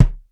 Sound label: MUFFLED BD; East West; Global Sounds; R8M